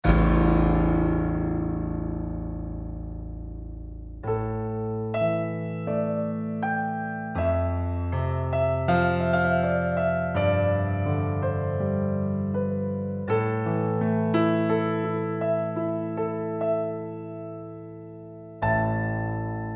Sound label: JD800 Piano Card